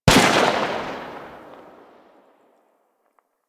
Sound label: Pistol Tail; Grn Kohm Pistol; Warframe; Weapons; Grineer